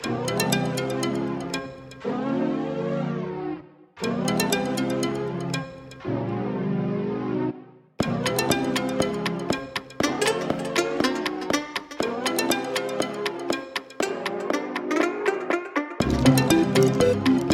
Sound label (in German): Gesampelte Musikinstrumente; selbstgebaute instrumente